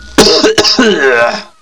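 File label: DRY THROAT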